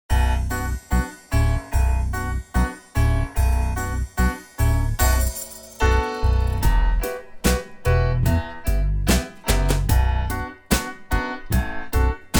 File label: Piano Mix